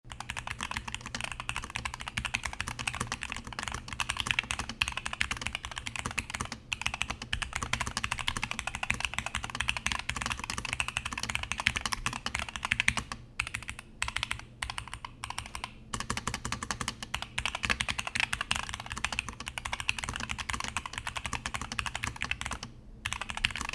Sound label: made68 air audio, gaming keyboard; melgeek